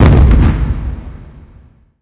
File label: impact explosion hit effect; Earth and Beyond; EA Games